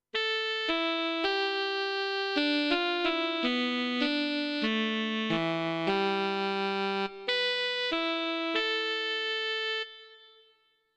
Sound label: Hyper Alto Sax